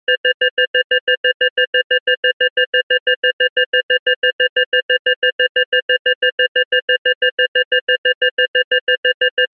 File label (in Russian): elektronnaja trevoga